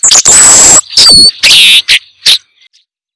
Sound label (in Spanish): primavera